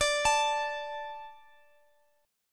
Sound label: D 5th, Guitar Drop D